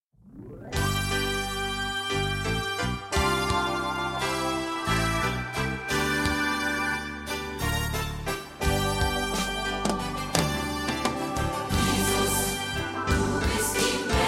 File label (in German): Chor